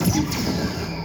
phaser

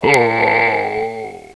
arms lunge, zombie

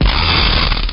attack; tripodhopper

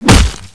claw strike

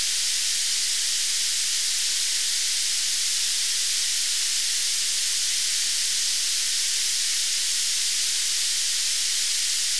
gas hiss; ambience

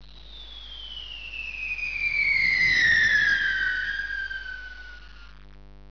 bomb fly

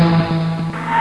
crash

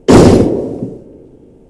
train smash

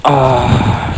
uhh

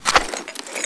rpg reload prep

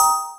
pling

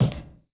step

